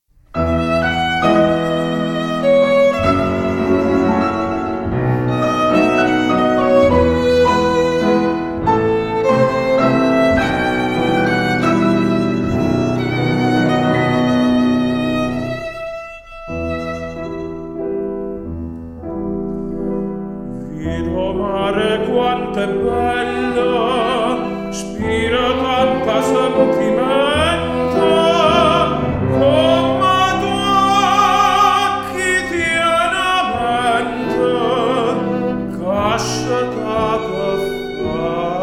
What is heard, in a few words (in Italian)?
tenore